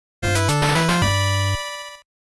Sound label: Start Signal; Terra Cresta; Nichibutsu Early Collection Special CD